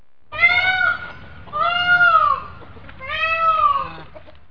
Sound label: peacock